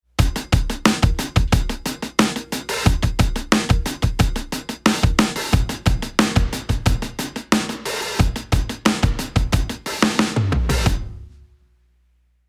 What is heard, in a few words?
Crystalline Drums